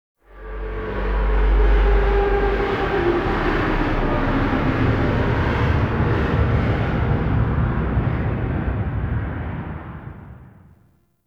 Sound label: Ship Spawn Delay, Dropships; TheTeacher